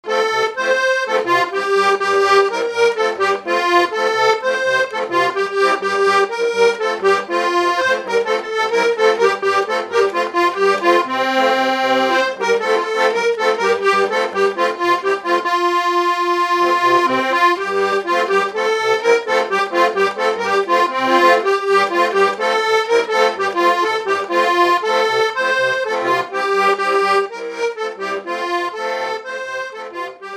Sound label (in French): Polka piquee